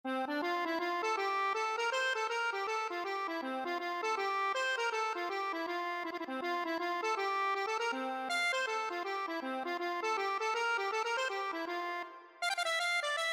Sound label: accordion